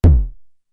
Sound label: BDrum, Drumfire DF-500